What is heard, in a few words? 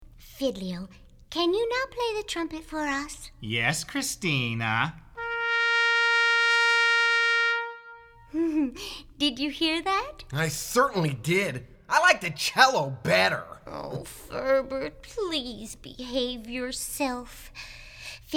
Trumpet